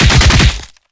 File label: pummel; battle